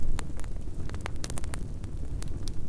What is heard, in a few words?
Fire Crackly